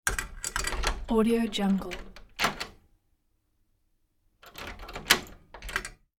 key inserted into metal lock; industrial sounds

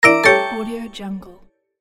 wrong; جواب اشتباه; interface sounds

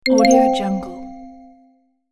ui notification

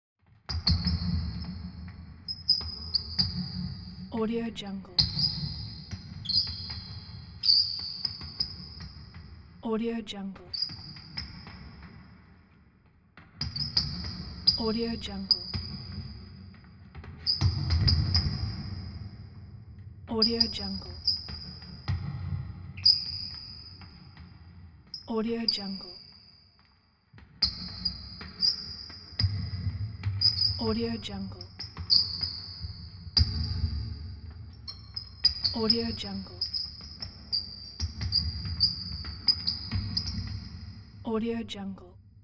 basketball game shots squeaks; urban sounds

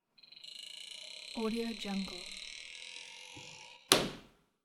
balcony door closed squeaky; domestic sounds

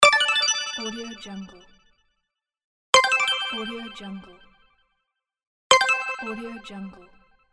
space achievement; game

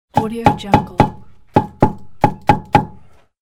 rubber hammer hitting bricks; industrial sounds

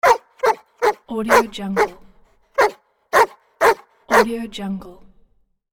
east european shepherd barking; پارس کردن سگ نگهبان

